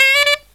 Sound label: SAX, Killer Brass Riffs